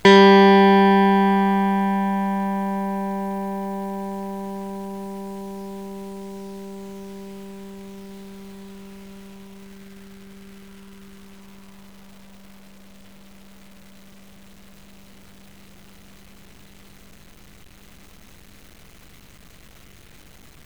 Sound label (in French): sol corde 3, notes longues